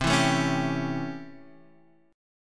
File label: C 6th Flat 9th chord; C6-9 strummed